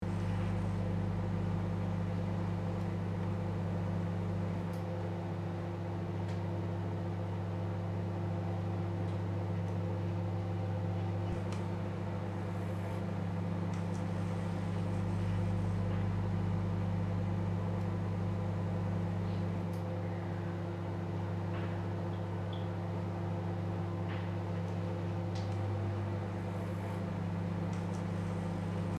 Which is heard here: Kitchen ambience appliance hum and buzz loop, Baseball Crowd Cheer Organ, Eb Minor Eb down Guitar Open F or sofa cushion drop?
Kitchen ambience appliance hum and buzz loop